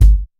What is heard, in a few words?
smooth bottom end kick drum G key